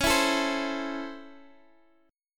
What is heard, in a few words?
C sharp Minor Major 7th Sharp 5th, Guitar, Standard; strummed; x,x,11,9,10,8